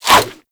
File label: Sword And Whip Whoosh; Warframe; Weapons